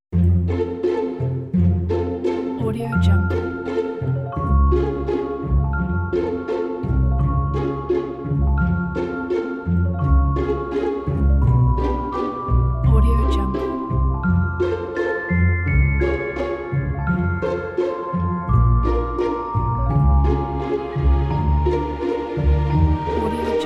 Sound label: christmas fairy cartoon